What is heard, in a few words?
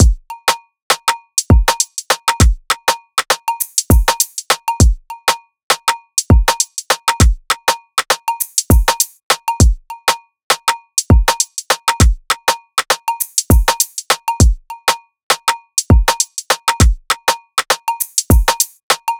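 100bpm, Drum loop, Afro, Layered, A minor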